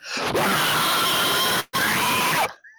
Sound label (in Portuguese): gritandoo